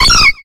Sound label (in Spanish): Grito de Cleffa